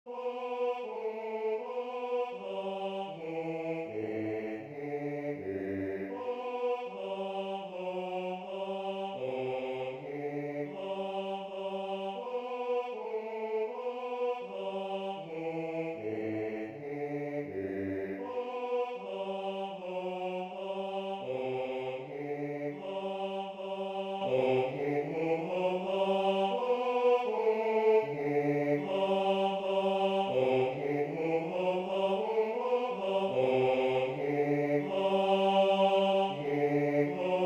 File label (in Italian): Basso; La natività (Gauntlett); Natale